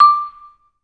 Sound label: VB MUTE D5; Best Service; Peter Siedlaczek's Advanced Orchestra